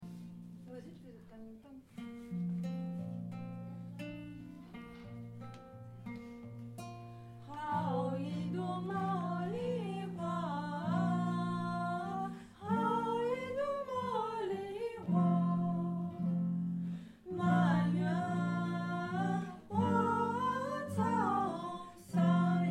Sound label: mo li hua